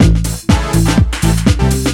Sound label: Old Gay House Loop